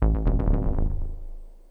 Echo Bass; Lost Tapes From Mars

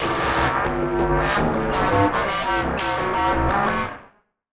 Casio, Glitched, malfunctioning synth

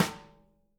BRUSHES, Living Drums

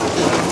Ambient loop; Majin Tensei 2

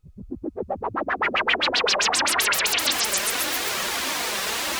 Juno-60, Filtered Noise

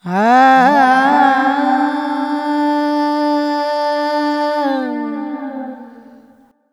Vocals Male, World Pop; Sonic Foundry (Sony Creative Software)

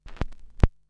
Stylus Noise, Vinyl Noise

